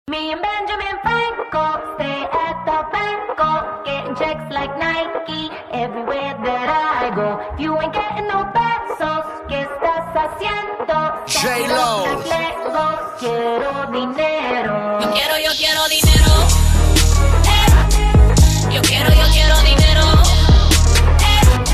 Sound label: Trap & bass